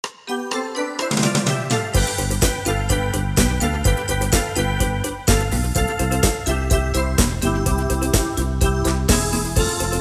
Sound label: Schlager Beat